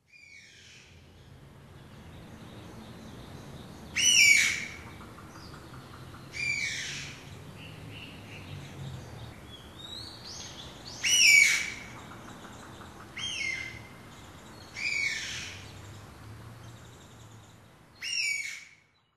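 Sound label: Chinese Bamboo Partridge; koe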